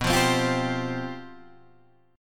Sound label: C Suspended 4th B; Csus/B strummed; JGuitar